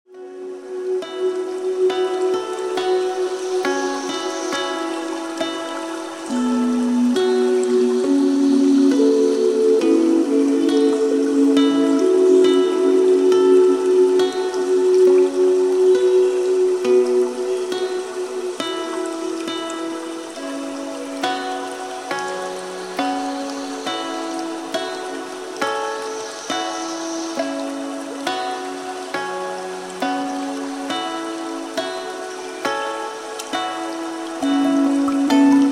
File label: Relaxing Music